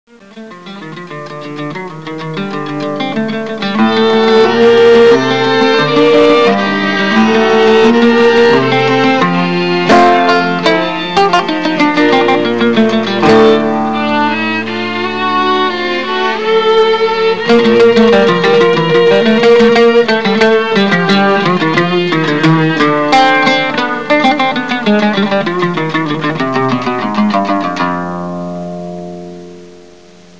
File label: oud; assyria